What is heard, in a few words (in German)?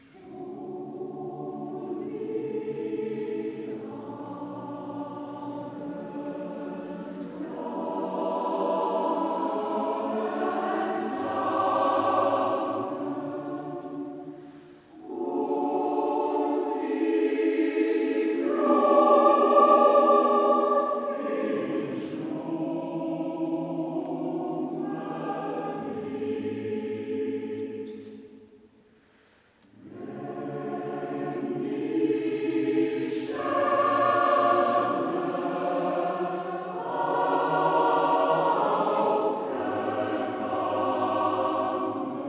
Abendglocken